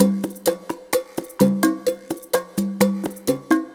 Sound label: Percussive Loops 128BPM; Vengeance Samples